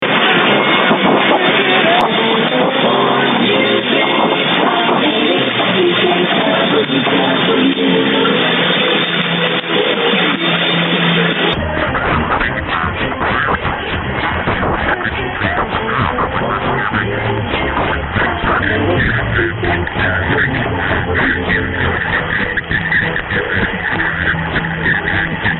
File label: singing carrier only; 580; ckww; cfra